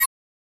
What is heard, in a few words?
Number Calculation